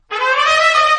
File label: trumpet danger; music